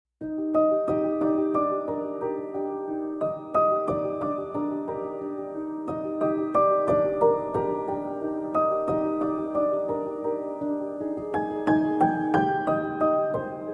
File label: Solo Piano